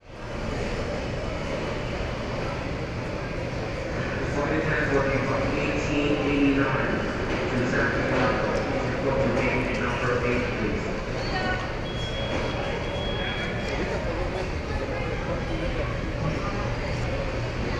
check point, ambient

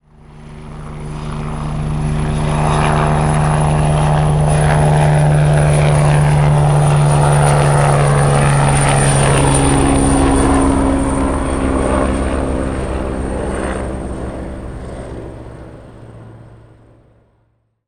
helicopter fly over; transportation

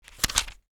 page flip

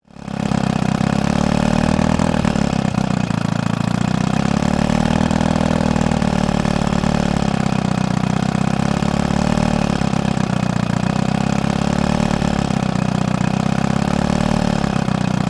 boat outboard motor; transportation

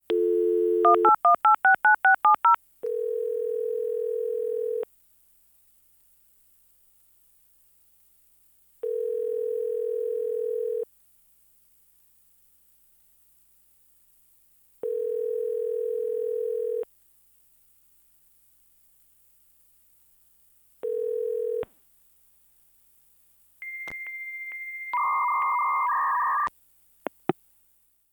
modem calling tone

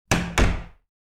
door close

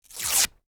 paper rip

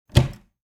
cabinet door open

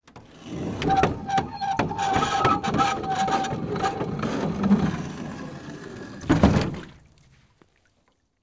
rowing boat push water; transportation

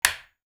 switch

